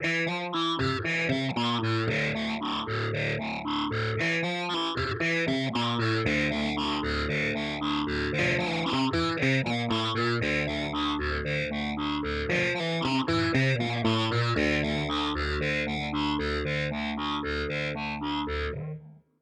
Ultrawave SA 250 Preset with Morph other Distortion; Source Audio